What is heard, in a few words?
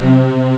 cello section; Amiga Soundtracker Sample Packs